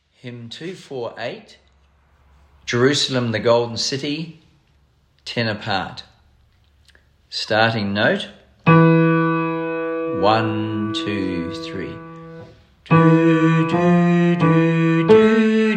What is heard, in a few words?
Tenor SATB; Jerusalem, The Golden City; Hymns for Jubilation; in house choir